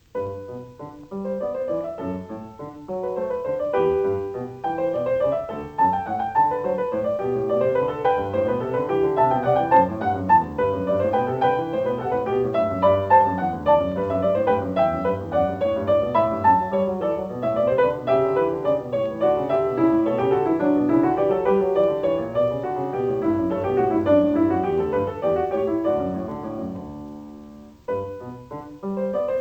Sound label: Goldberg Variations, Variation III - Canon at the Unison; Claudio Arrau; Bach; BWV 988